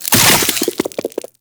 Infested Beam Pistol Empty Clip; Weapons

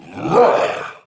Punch, Barks; Kuva Lich; Persona A; Ability

